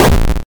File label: die